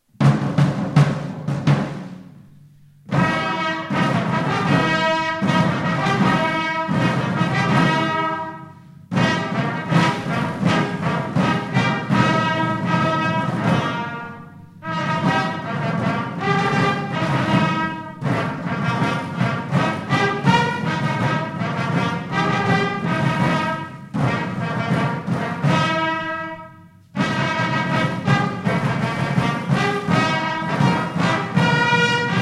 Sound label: march de la legion es horn